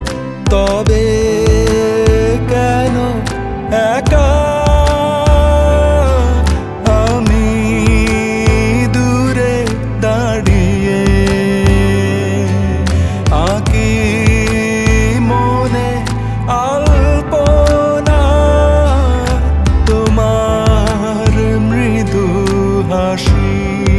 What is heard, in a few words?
Bangla Song